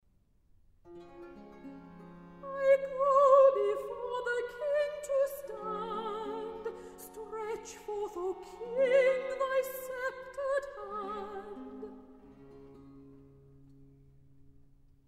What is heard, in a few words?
Recitative; I Go Before The King; Handel; Esther; HWV 50A